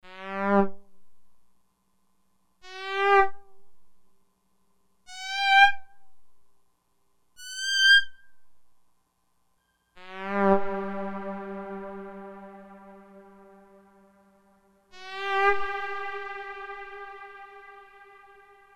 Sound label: Lower Tone, Roland D-50; 37 Soundtrack